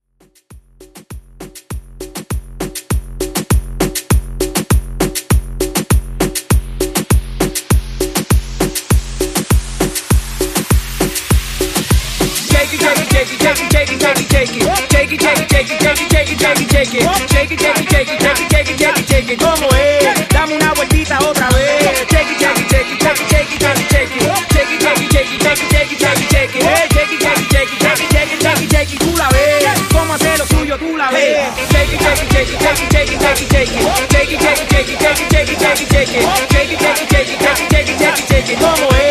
Moombah; Cinco De Mayo; Mashup

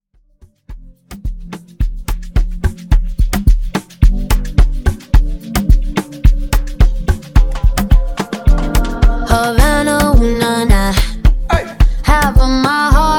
Afro Beat Mash Up

